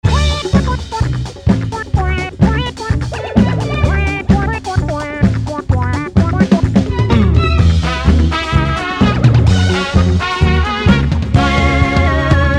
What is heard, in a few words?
ringtone